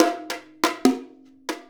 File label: Bongo With Stick; Drums; Bongos; Essential Percussion